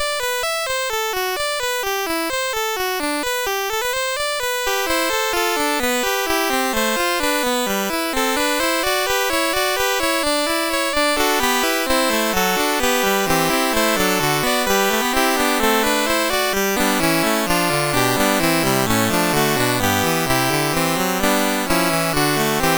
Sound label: Fuga, MOS6581R3